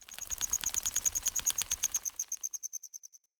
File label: Day Insect Close